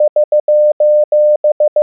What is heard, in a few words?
sos, morse